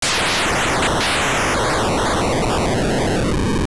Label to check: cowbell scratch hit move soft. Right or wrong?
Wrong